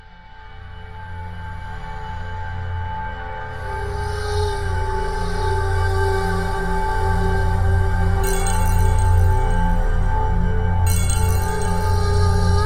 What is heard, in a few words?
ambient